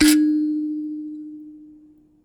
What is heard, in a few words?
Finger, Prepared Kit; Battery 3 Sample Kits